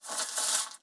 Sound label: ancient paper scroll